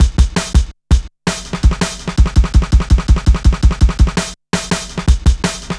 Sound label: Shuffling Live, Jungle Drum Loops